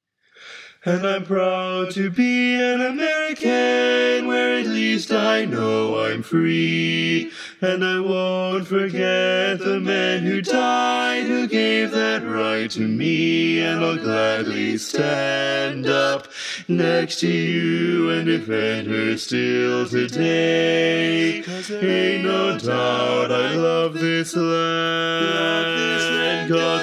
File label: Bass